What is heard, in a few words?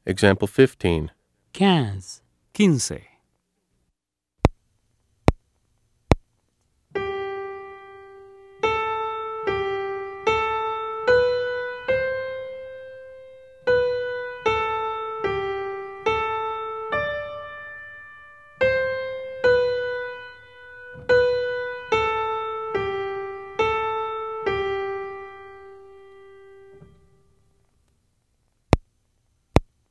Keyboard